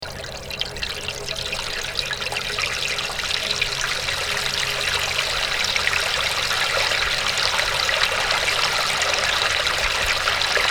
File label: Contact Mic Water Pipe